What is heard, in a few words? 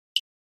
Flash Gear HF Metalli